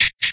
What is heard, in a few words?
Reload, Guns; retro arcade